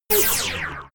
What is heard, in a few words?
laser beam shot; krieg-waffen; soundpool